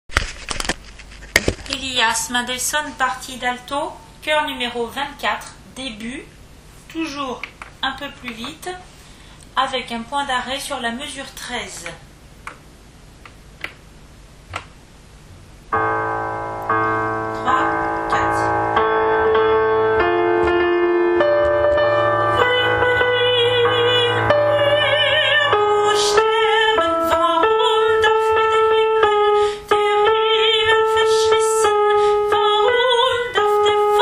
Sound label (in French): point d'orgue